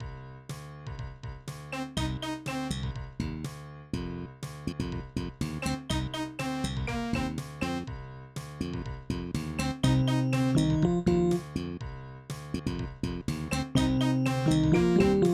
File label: Roland SC-55; SimCity 2000